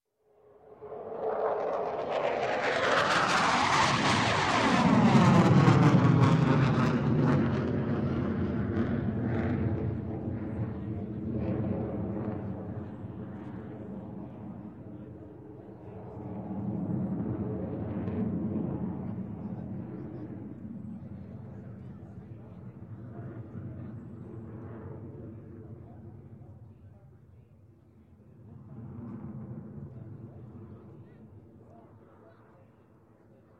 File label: F-16 Several Passes; Sound Ideas; Series 2000